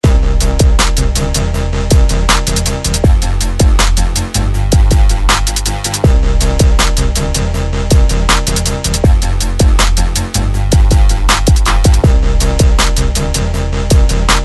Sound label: shake; nickelodeon